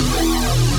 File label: hypergrowl, bass